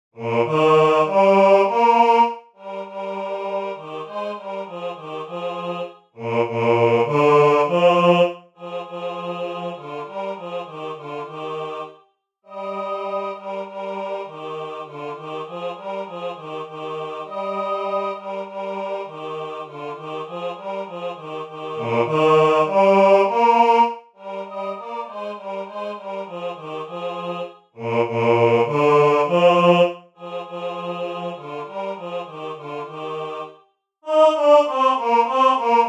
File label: bass; Wer klopfet an